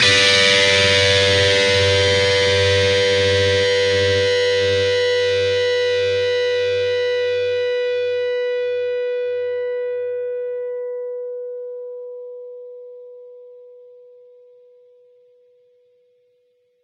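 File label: D minor chord second octave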